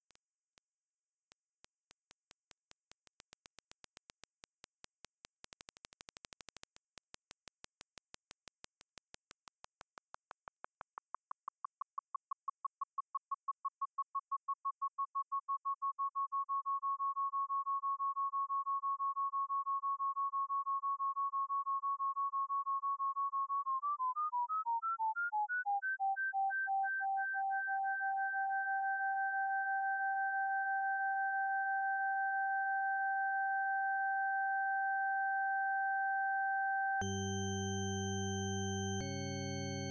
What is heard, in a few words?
mirror music LOOP GAPLESSLY